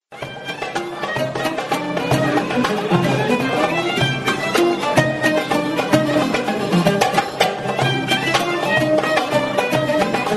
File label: karsilama; EKDROMES